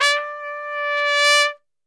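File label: D 4 trumpet; Best Service; Brass Super Section; TRP SWL; 90 s Sample CDs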